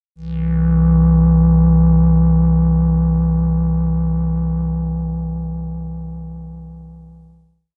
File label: SYNTH